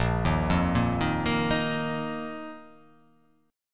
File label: A sharp Suspended 4th; arpeggiated; A#sus4; JGuitar